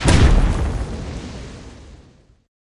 Tunnel Into Ground

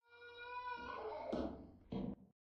Voice Cry; Lethal Company

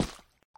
Gravel Wet; Lethal Company

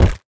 Height Damage

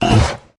Frighten

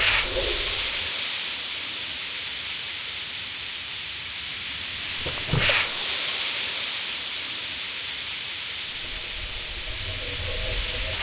Pipe Burst Blowing Steam

